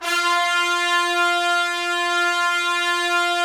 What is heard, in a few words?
Trumpets; Roland - LA Composer vol. 4